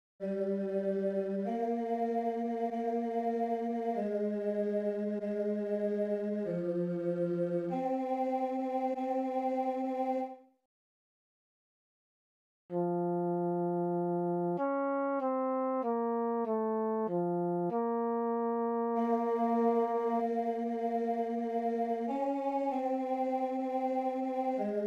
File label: stabat mater tenor